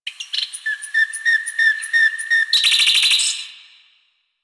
Drifter Camp Valley Tree Critter; Ambience; One Offs; Warframe; Quests; The New War

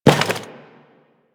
Worker Step Default; Solaris; SolarisWorker; Steps; Warframe

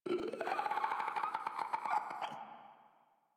Infested Runner Patrol; Warframe; Runners

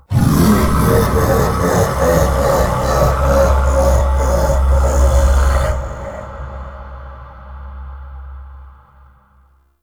Tablet Wrong Answer; Warframe; Duviri; Easter Eggs

